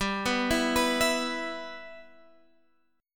E Minor G, Guitar

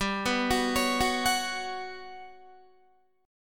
G Major Sharp 11th, Guitar; Listen to GM#11 arpeggiated; GM#11 Chord

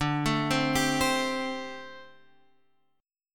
G Suspended 2nd Suspended 4th

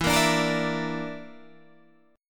D Suspended 2nd Sharp 5th E

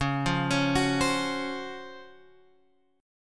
C sharp Major 11th, chord; C#M11 Chord {9 8 11 11 X 8} arpeggiated

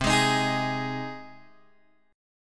C Minor Sharp 5th C Guitar DADGAD

